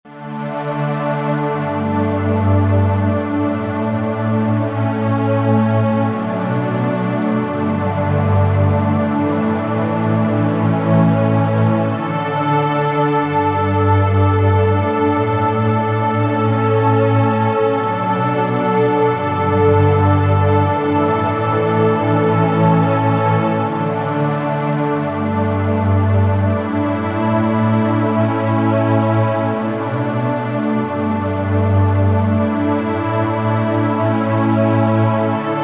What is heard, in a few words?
Underscore PAD; Worship Flow